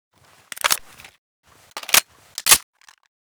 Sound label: hpsa reload empty